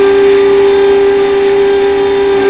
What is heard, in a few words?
crane moving; mechanic; Max Payne